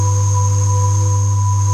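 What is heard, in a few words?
atmosphere loop, ambience drone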